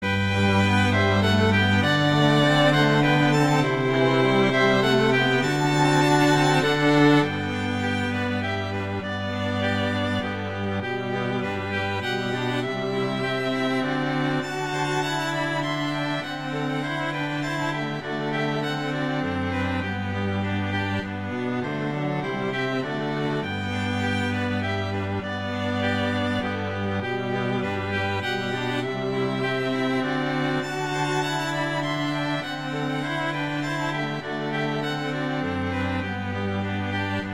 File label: Moderato espressivo; Toselli; Serenata